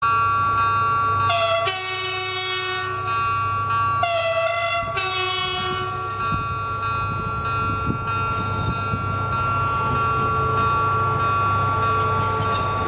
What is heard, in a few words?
horn; FGC 255